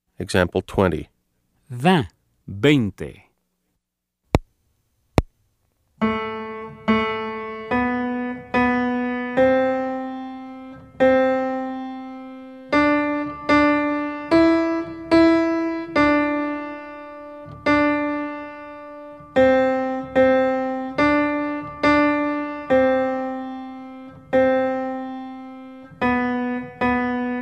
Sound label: Keyboard